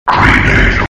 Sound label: grenade